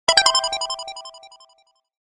Message; Nokia; 2760b

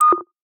Funny; Pantech Discover P9090; FunnySet

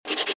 Key Tone

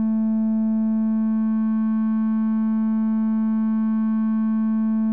bass Tone; Apple iOS 18.1

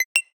NFC Transfer Complete; Nothing Phone (2)

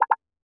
chat msg in bg; Nokia N900

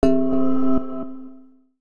Echo

